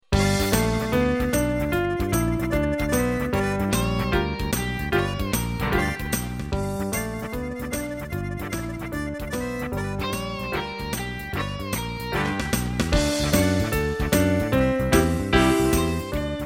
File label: Boogie Woogie Cookie Blues